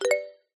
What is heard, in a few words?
multimedia game sound mallet positive advance